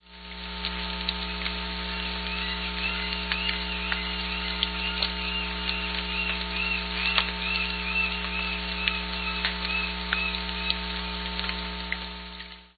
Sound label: seagulls, Salish Sea sounds